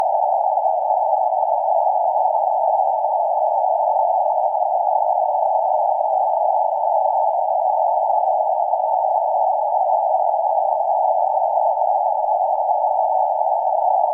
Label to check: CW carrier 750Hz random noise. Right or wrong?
Right